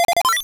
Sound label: Present Throw; sfx; attack moves; pokemon; gen 2; gsc